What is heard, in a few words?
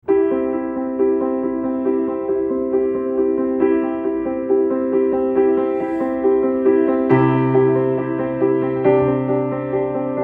piano